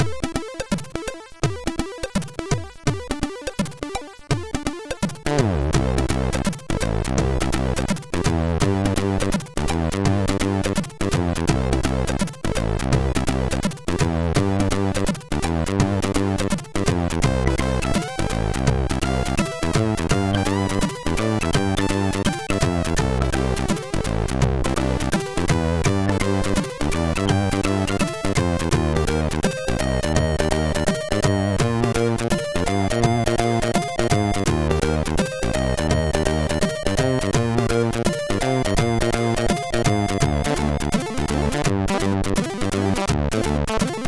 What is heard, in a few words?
Jazzy, sid MOS6581R3